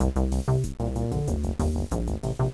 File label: groovy; Querkz 1.5